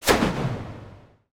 retro explosion; retro synth SFX

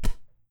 hit

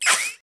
weird, sfx

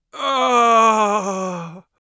death, Mage Voice Pack

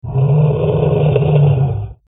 Monster Growl; horror sfx vol 1

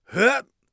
hup, Warrior Voice; Warrior Voice Pack

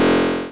powerup, Retro Sounds 32-Bit

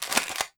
shotgun pump

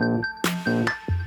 Syncopation; BB 2HTC Samples Vol 4

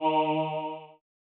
Retro Instrument choir bass C11; Instruments

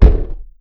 shoot, weapons

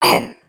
monster; CC0 creature sfx 2

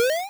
pickup success, square waveform; Square Waveform sfx

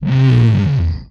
inhuman moan, vocal; retro arcade; MMRetroArcadeSoundsPack

